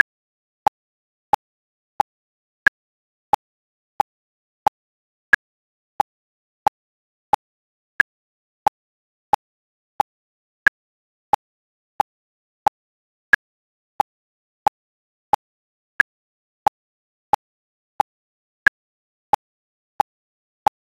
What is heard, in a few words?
4/4 rhythm metre meter time measure; Кросс-степ вальс